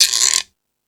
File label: Percussion, Drum Machines E-MU Pro-Cussion